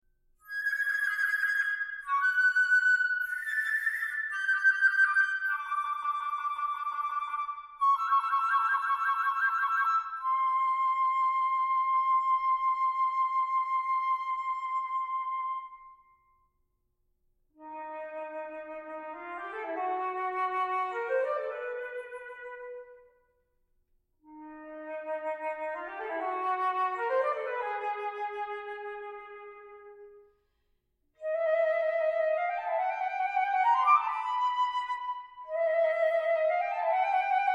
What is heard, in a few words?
Solo flute